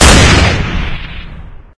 m60 fire; svencoop; st mission

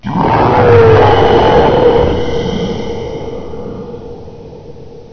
rocket; weapons; svencoop

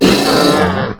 worm attack; npc; svencoop; morass beta

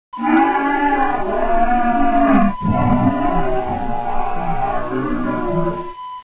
monster; svencoop; sc deadthings

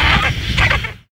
condor pain; npc; svencoop; morass beta

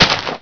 player grass; svencoop; sence; mistake coop

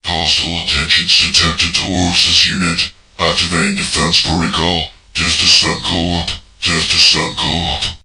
boss taunt; svencoop; tower6

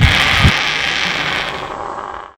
condor die; npc; svencoop; morass beta

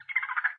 random jungle; svencoop; underrain

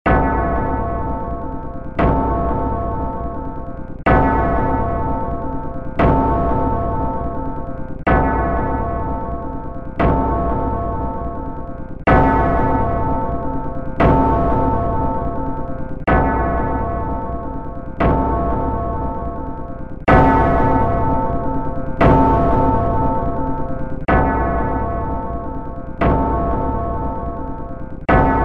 music; svencoop; sence; mistake coop

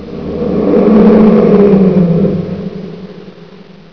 attack, flame; svencoop; overlord flame

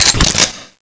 mac draw; svencoop; scshogo

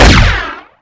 oicw fire; svencoop; scshogo

